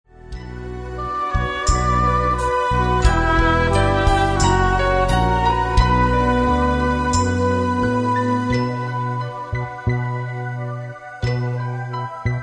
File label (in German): Zwischenmusik